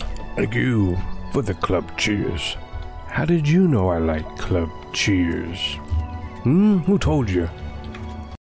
cheers; rpg club

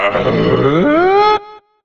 grunt; tim allen

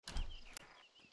door open; dayz

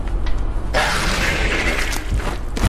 scream; yarnaby

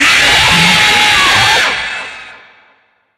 jumpscare sound; project fusion

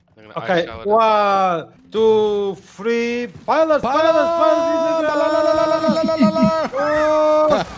pylon chant

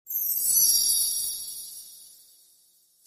magic sfx

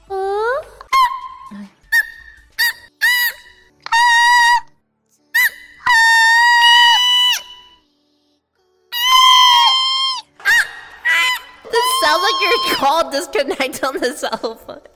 high pitch; saru

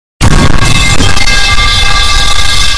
extra load pipe clang

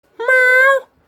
meow

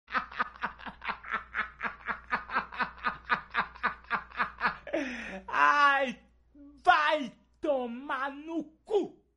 hahaha

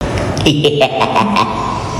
awkward laugh

